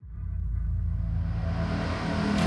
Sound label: Battle